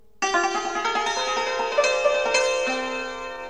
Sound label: Santoor Sms